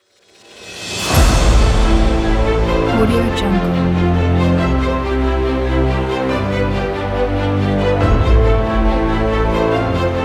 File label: epic dubstep